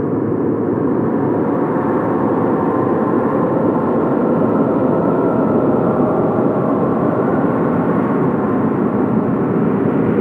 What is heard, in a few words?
Ice Interior Ice Cave Amb; Warframe; Corpus